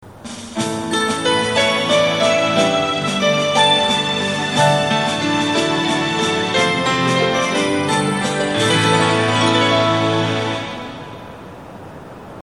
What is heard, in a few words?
Kyushu chime